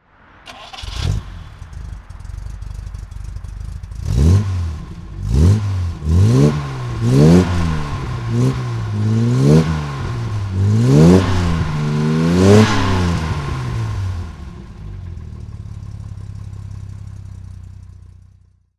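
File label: jaguar etype fhc s1 throttle, engine sound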